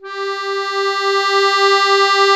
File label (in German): AKK VIOLISW, akkordeon